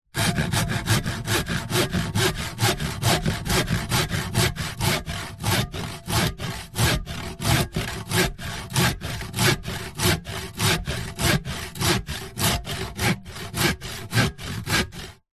industrial hand saw sawing wood hollow fast into slow pace